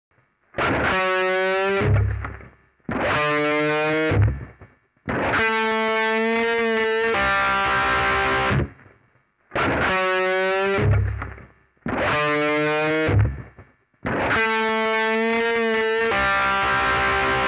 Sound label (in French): guitare solo